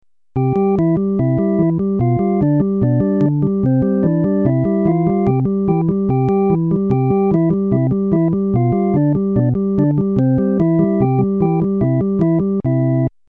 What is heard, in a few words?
flute